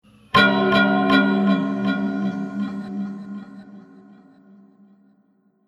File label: Heavy Undulating Hit; Low Impact Sound Effects; Andrew Fleming